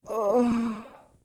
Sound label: groan; Pacifica